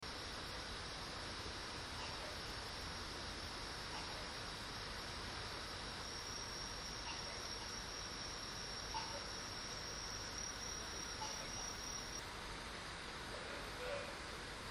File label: window open; midnight